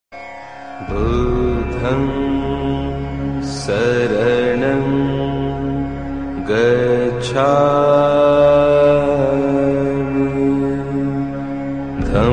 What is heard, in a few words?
Buddham Saranam Gacchami Ringtone